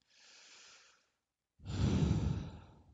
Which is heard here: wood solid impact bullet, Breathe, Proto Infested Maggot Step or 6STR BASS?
Breathe